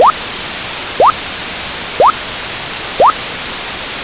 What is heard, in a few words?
noise and chirps; cts; android 6.0.1